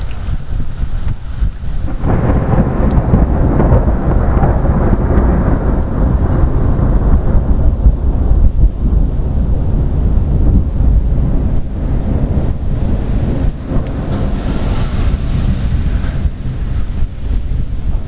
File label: thunder; Natural VLF Radio Emissions